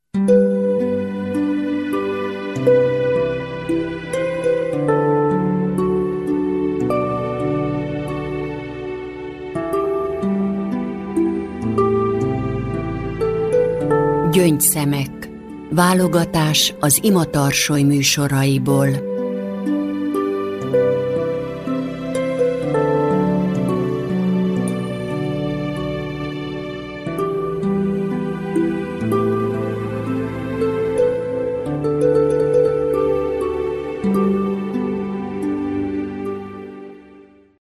JINGEL; Imatarsoly; IN MEMORIAM; Felvidék

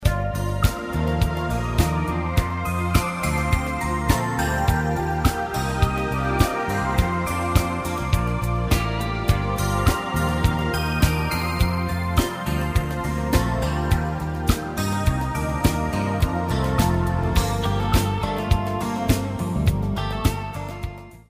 alapjingle szoveg nelkul; FREKVENCIA

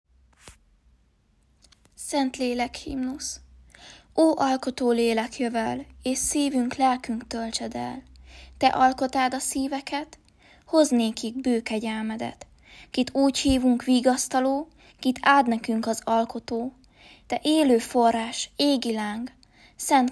Szentlélek himnusz; Engedjetek hozzam a gyerekeket

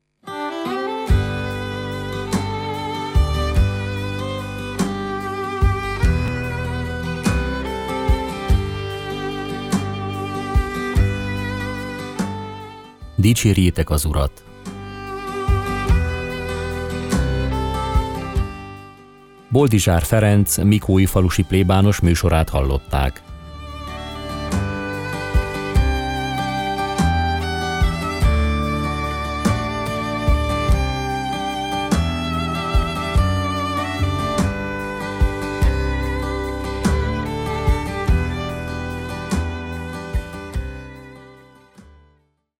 Jingle vege rovid; Dicserjetek az Urat

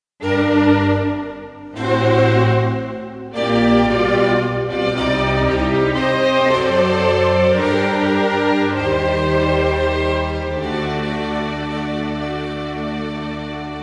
Instrumentalis - klasszikus - Vivace, grave; Fillers

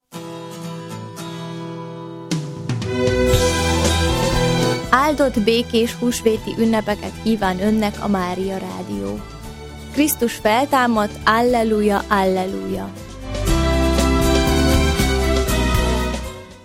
Husveti Jingle; Husveti Szignalok

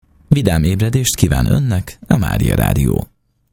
Reggeli J

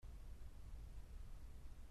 gitar; Barrios; Catedral II.

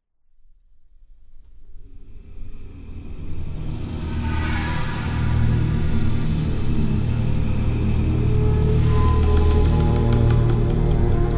Instrumentalis passio; Reggeli Musor szignaljai

